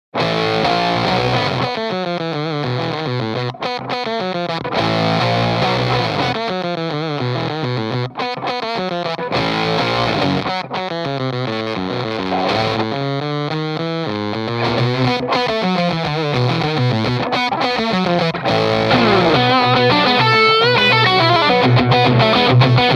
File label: Sidewinder - Dirty Boy Afro Fuzz; mojave